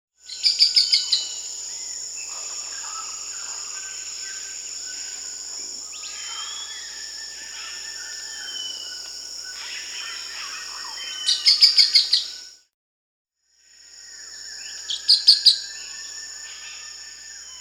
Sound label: Rufous-rumped Foliage-Gleaner (Philydor erythrocercus), Peru; S. America